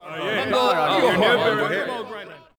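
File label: men murmur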